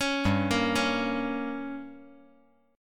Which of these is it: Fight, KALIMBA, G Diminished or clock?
G Diminished